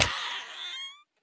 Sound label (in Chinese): 海龟幼年死亡声